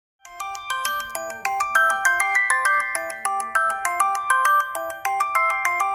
Melody Alarm

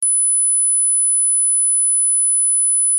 10000 Frequency Tone

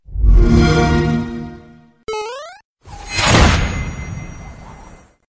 Gaming Notification

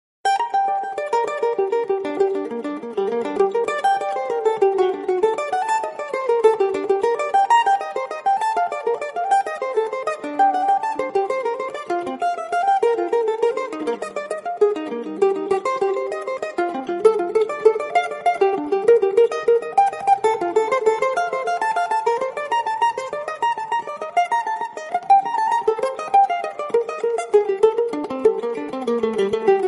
Strings bulbul banjo

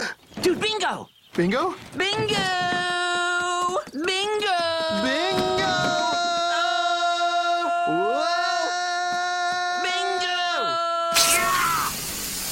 Bingooo ringtone

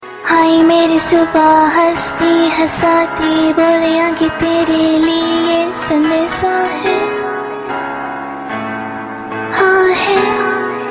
Cute Song

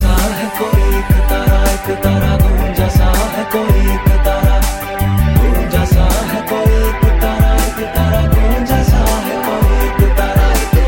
Ektara Love Mix

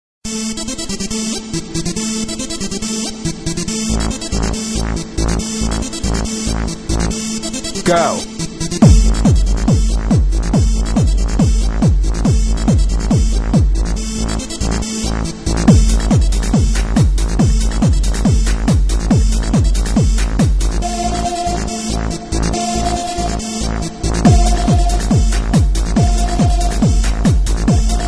mix ring; Airtell

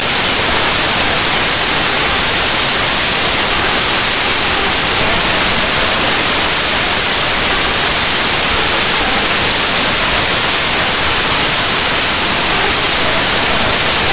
Synth Sample

